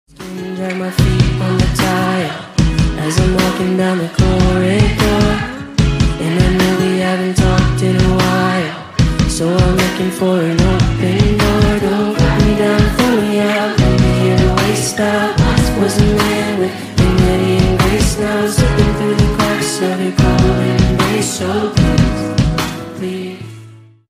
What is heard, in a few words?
New English Best Song Ringtone